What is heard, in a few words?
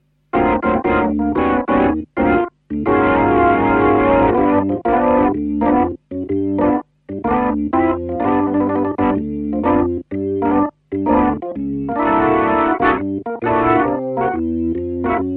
blues v-twin